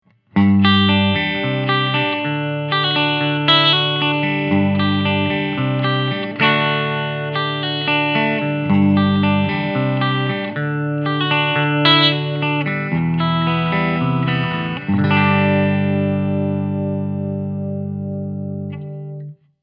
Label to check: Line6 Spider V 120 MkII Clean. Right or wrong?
Right